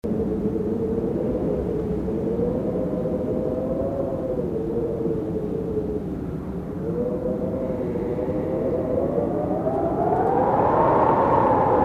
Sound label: WIND Interior Moan Draft; gamerip; The Unfinished Swan